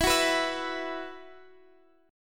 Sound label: F sharp 7th Suspended 4th E